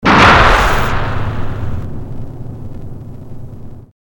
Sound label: explo